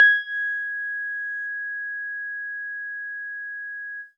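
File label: EAcoustic DX100 G#5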